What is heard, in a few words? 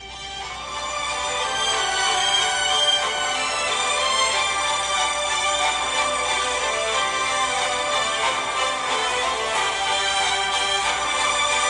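samsung a51, ringtone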